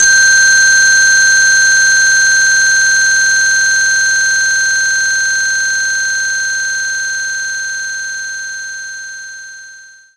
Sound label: crystal G6